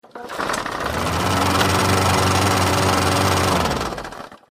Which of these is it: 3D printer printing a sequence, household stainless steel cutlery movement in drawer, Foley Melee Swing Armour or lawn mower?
lawn mower